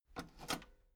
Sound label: metal lever button radio enable slow